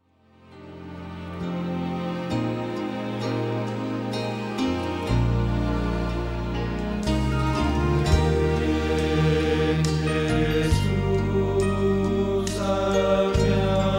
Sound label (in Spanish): Bajo